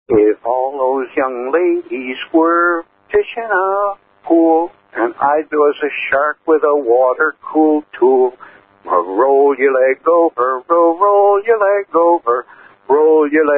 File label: Roll You Leg Over